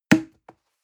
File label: plastic can small fall bounce hard; objekte; dose